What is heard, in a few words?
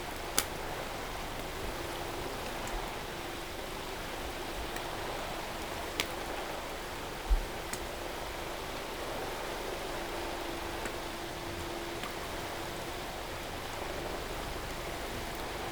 No Ship, helicopter